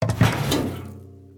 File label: tank In; World Of Goo